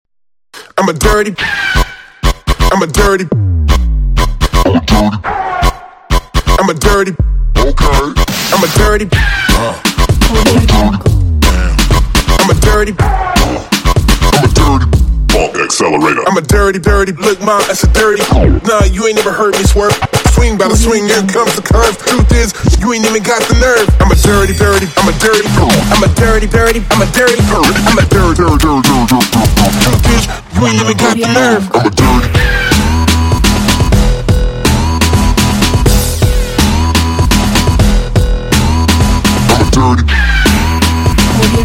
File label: fast dirty rap vocals